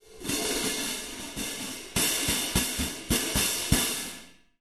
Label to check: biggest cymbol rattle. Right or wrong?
Right